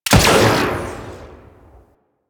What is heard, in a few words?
Freeze Ray Shot; Warframe; Venus; Venus Shockwave Spaceman Agent